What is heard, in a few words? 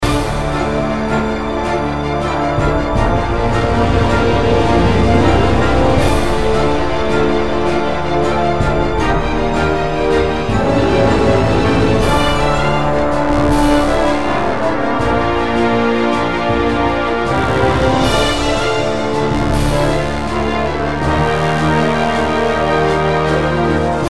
Orchestral Version